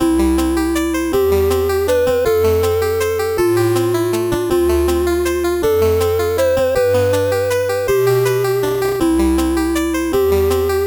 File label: 8bit bgm normal